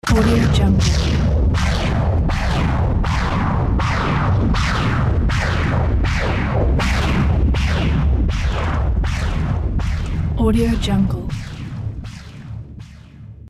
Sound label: alien terraforming